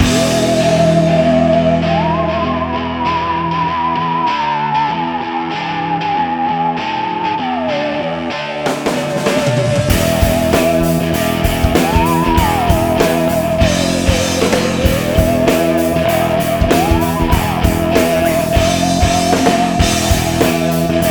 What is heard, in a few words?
Halloween Rock; Meow Motors